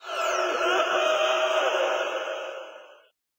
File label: Ambiance; Fnaw1 New Ambiance1